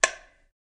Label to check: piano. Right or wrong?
Wrong